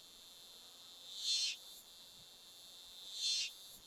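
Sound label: Amb Hong Kong Insect Cricket Loop Reverse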